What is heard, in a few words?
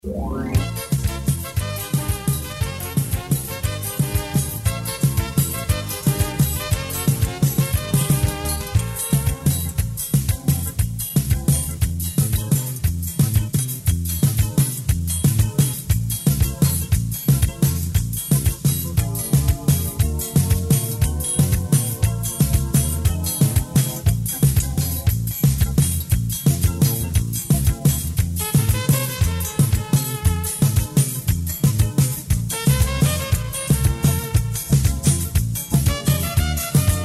Sega socolat instrumental